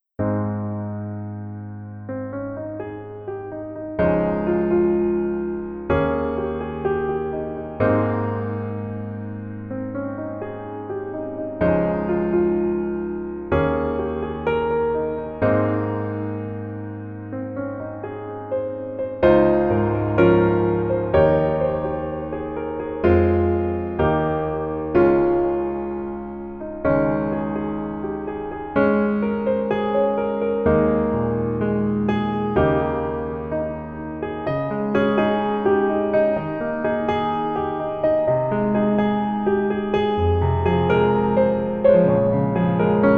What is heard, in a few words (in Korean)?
Piano ver 멜로디MR -1키